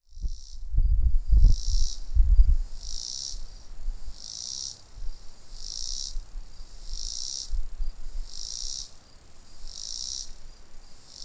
Robinsons Cicada; Confederate Ave gettysburg

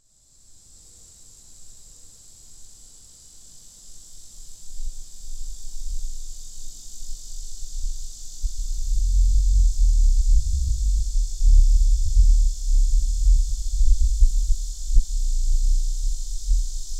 Lyric Cicada

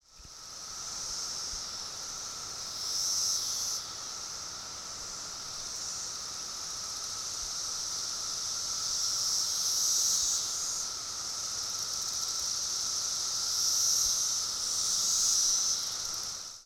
Cassins buzz call, cicadas

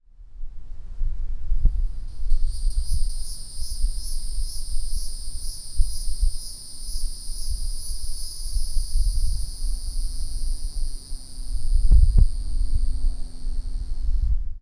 Hieroglyphic Cicada Revving Up; cicadas

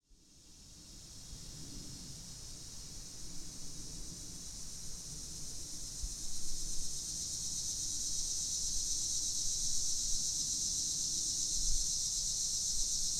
Linnes Cicada; cicadas

